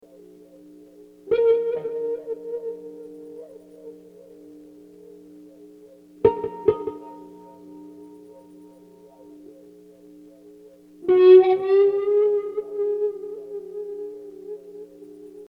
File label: rock